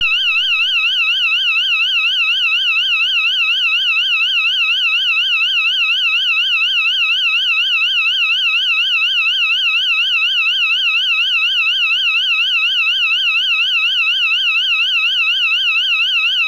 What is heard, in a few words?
ALARM, Piezo Siren